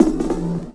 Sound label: FX Beat, Drum N Bass; Sonic Foundry (Sony Creative Software); Knutrix Drum & Bass